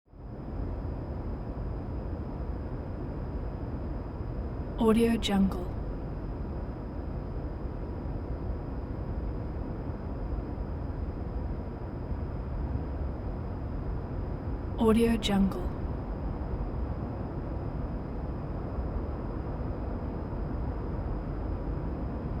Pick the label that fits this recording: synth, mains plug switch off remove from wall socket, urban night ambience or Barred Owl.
urban night ambience